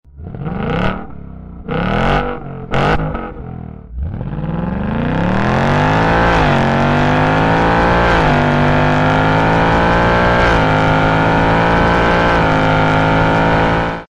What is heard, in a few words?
ford mustang exhaust ringtone